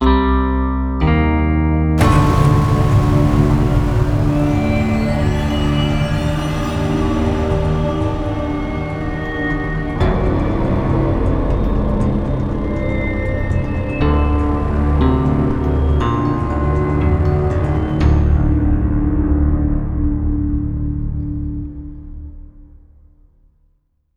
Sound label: Round Start; Buried